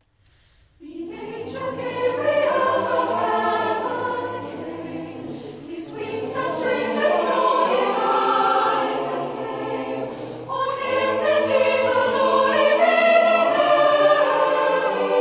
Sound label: Choir; Gabriels Message; Brisbane Concert Choir